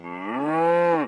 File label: moo, Cow; Cow Construction Kit